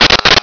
buzzer

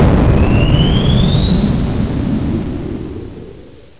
SSHIP, motoren

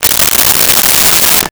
Cordless Electric Drill Tighten; Tools

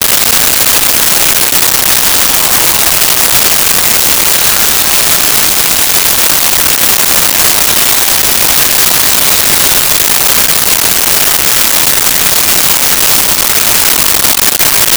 Roto Saw; Tools

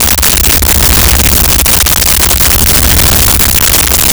Large Power Source Loop, Science Fiction

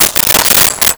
Silverware Placed on Table, Kitchen

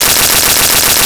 Arcade Movement; Video Game Sounds

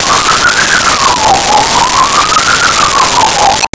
Ghostly Chirp Floating, Horror

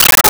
Arcade Chirp; Video Game Sounds; 1001 Sound Effects

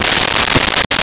Write on Paper with Pencil; Office Supplies

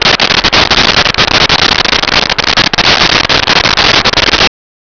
wings loop; watto

